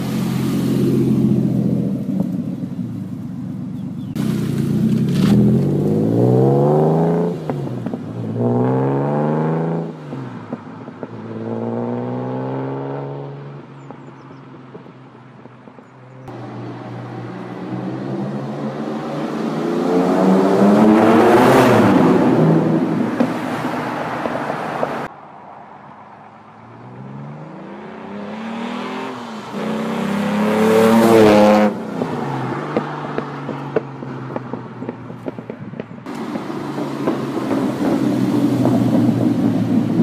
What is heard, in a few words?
exhaust tone